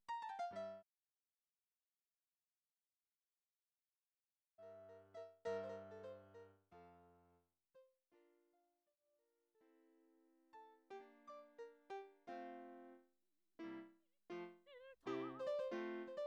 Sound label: Mozart Panned Both Ls